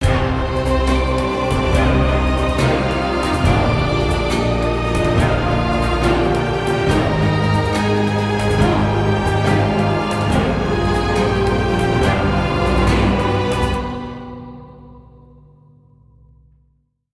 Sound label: Battle Drums; Necropolis